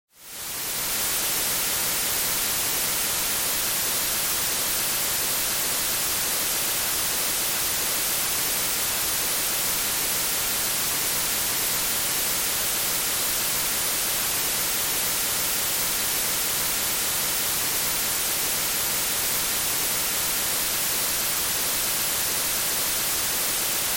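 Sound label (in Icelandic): gagnsuð með hátíðnitóni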